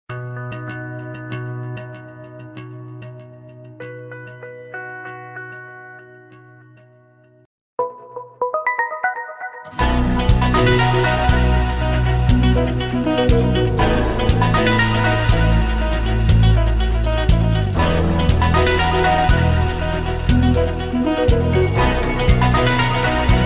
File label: samsung mix ringtone; horizon